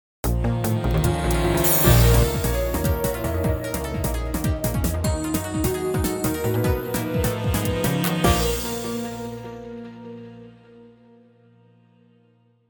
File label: Intruder Confirmed; gun point; Crosswire (Unused)